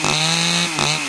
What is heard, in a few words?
engine, manhack; hl2